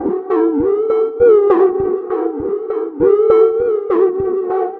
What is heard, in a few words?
hmmow GbA2, 100bpm; transx loops